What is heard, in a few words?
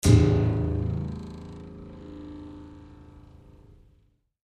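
Grand Piano Strings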